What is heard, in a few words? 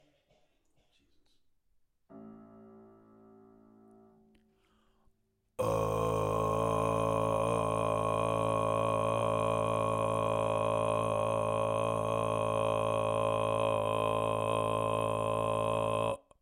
Karygyraa